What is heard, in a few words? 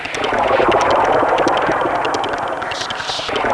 WNOISE; Zero-G Ambient 1; WNOISE ATMOS